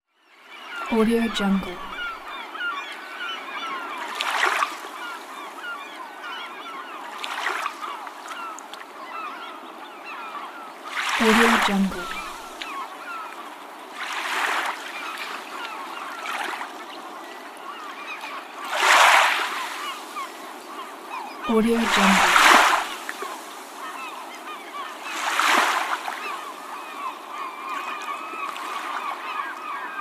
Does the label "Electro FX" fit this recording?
No